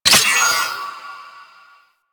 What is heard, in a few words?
Lightning Rod Charge; Warframe; Ambience; Corpus Gas City; Gameplay Remaster